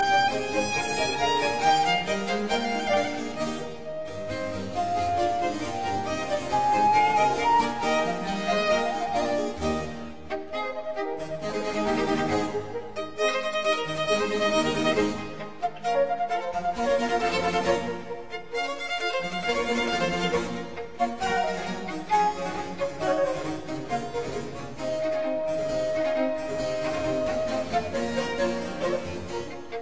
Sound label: Quartet No 3 in A major, Allegro; Quatrieme livre de 6 quatuors; TWV 43A4